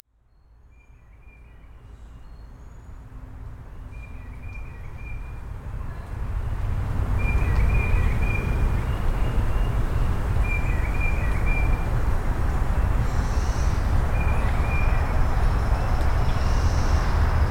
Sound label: cicadas